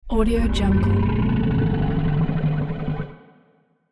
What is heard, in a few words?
the sewer monster